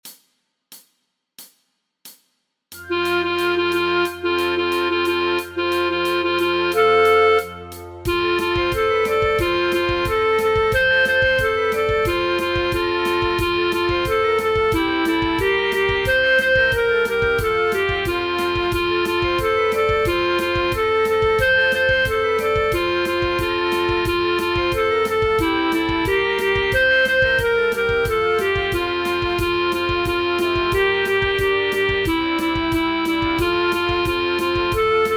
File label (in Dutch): voor oefentracks fluit